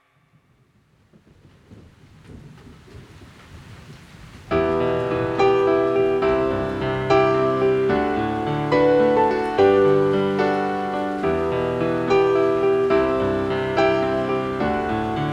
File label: Piano Solo